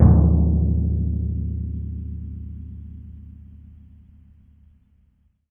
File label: TMP, Synclavier Percussion